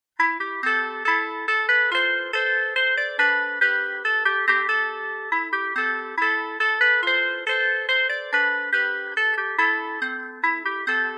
harpika

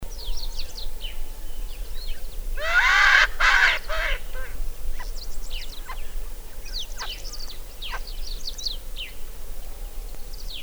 Erckel's spurfowl

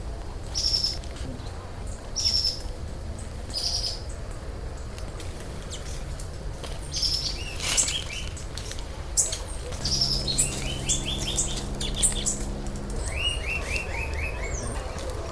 red billed scythebill

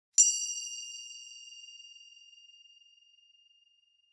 finger cymbals

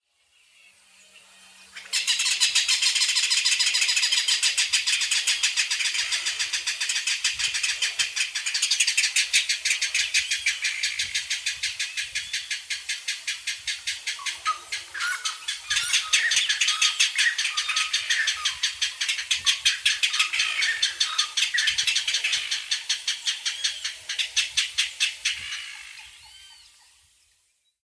Mangrove rail; پرنده یلوه حرا

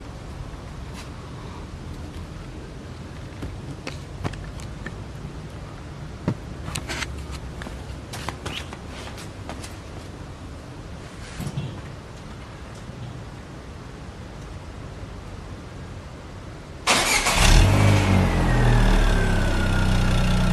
Dena exhaust

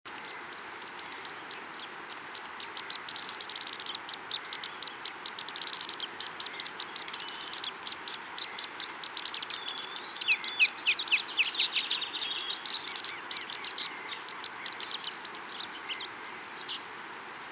Pink legged graveteiro; پرنده گورمرغ پا صورتی

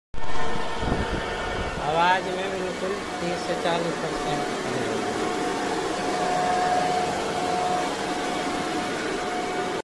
bitcoin miner

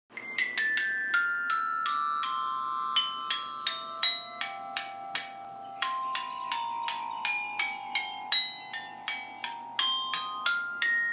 glockenspiel; گلوکن اشپیل

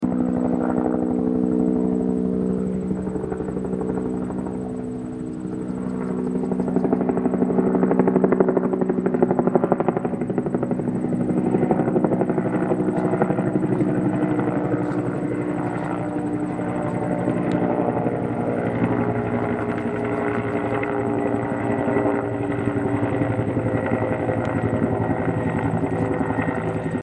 helicopter flyby

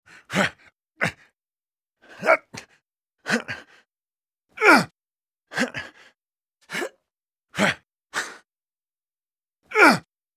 man sighs

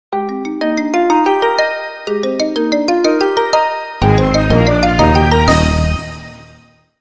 Pantech, زنگ گوشی

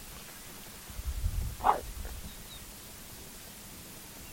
Kori bustard

